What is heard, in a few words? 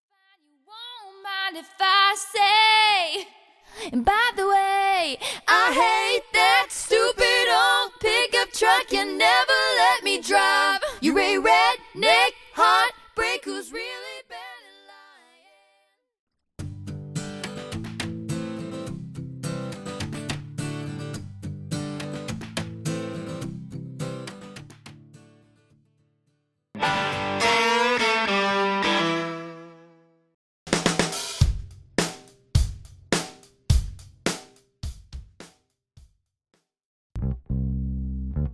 Acapella